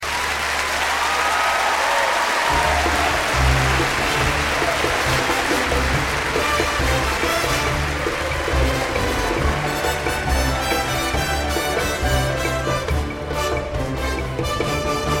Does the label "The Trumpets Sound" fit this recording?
Yes